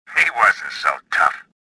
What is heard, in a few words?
victory; swamp2; Star Wars Jedi Knight II Jedi Outcast; XBOX